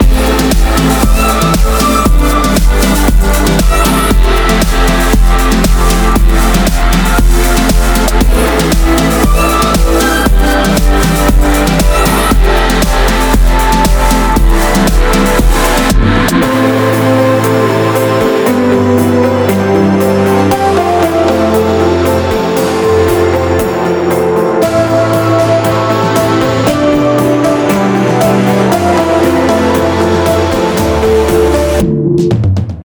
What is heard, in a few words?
Nest Dance Music (Loop); Haven